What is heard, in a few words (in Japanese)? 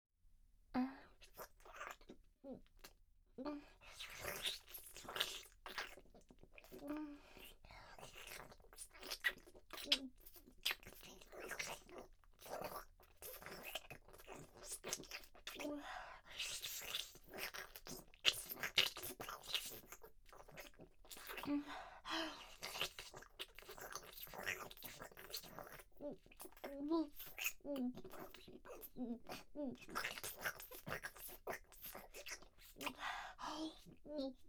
フェラチオ; SEなし